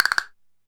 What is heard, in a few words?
Perc, Alesis DM5